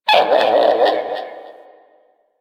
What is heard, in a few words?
Creature, Ambience; One Offs; Creatures; Warframe; Quests; The New War; Drifter Camp